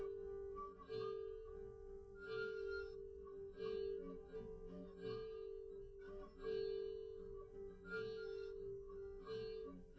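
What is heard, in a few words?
no drums, live recording 250ms 1m; SiSEC11; underdetermined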